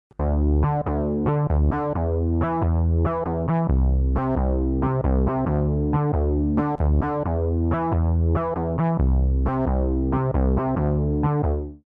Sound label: sq80 bassy